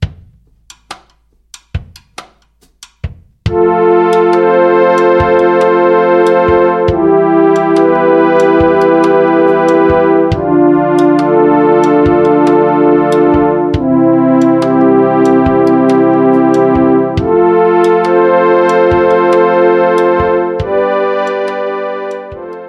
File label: saw strings